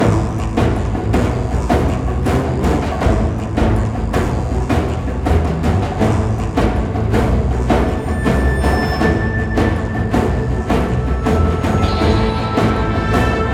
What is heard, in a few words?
Western Battle Loop